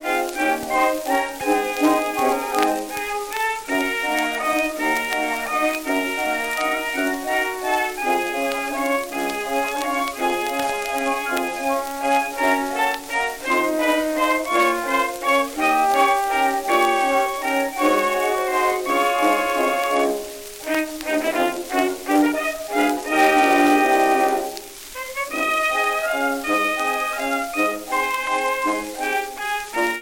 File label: Medley of waltz songs